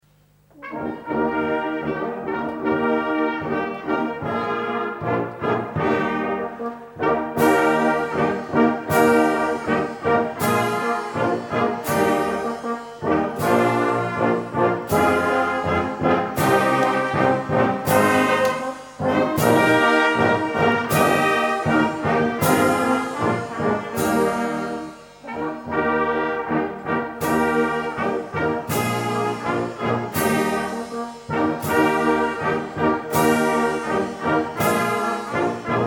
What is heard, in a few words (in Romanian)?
Fanfara; Sambata Weinachtskonzert